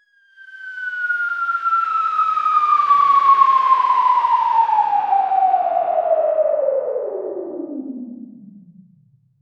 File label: a falling object that creates a whoosh as it descends